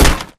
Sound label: bullet light ballistic spectre; Titanfall 2